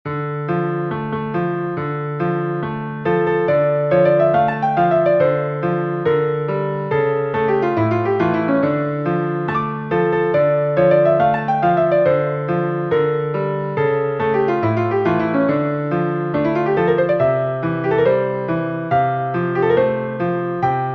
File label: Piano